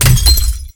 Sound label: glass; Frozen Fortress; Frostbolt Scroll